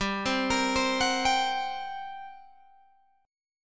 G Minor Major 7th Double Flat 5th, G, Guitar, Open G